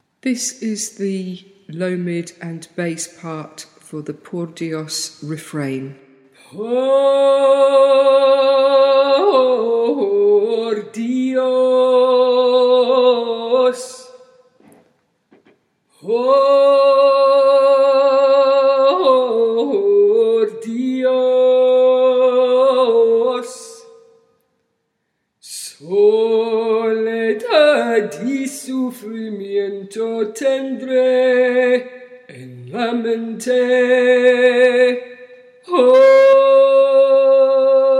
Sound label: low mid and bass; Por Dios